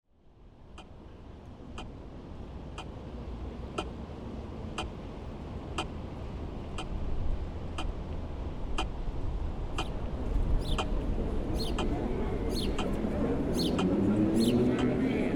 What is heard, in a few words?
soundscape favoriten